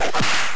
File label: ik punch; ikplus